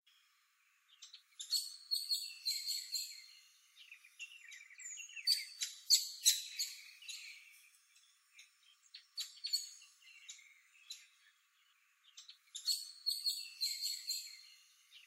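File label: kuroutadori, Common Blackbird; koshu; koe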